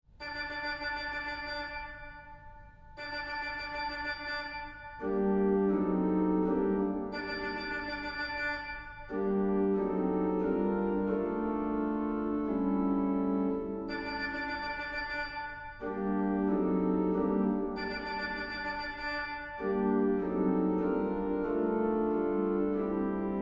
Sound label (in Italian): il picchio